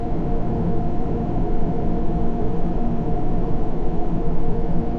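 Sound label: subtle room painting ambience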